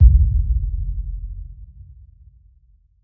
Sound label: Deep Impact